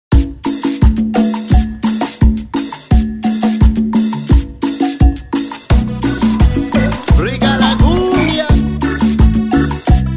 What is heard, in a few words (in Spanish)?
Cumbia